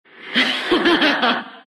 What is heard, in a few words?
laugh; dota; death prophet